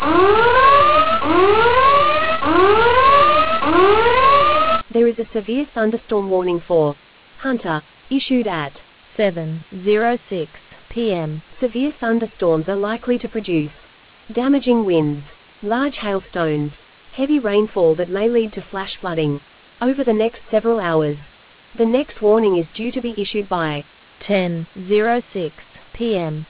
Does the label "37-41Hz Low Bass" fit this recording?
No